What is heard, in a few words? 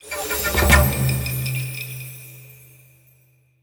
win Small; templeofnudges